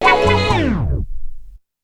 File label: MUSIC STP; BEAT2000